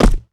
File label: land concrete, footsteps; player